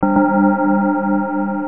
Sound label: COLOR KILLER ORB OR RAISE BOXES SOUND; Hemtrex 3D Pearls